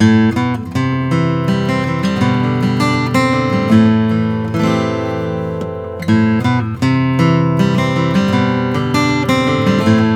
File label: german spruce, guitars; cp 14; james goodall